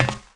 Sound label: Foot Grate; Player; Footsteps